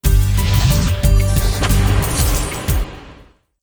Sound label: symbol coins; Lost Treasure; Wazdan